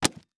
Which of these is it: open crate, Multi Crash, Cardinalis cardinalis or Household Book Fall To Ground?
Household Book Fall To Ground